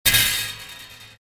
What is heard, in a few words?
stirring